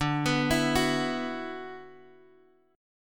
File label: D 6th Add 9th D, Guitar, DADGAD